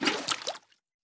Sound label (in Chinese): 美西螈装入桶声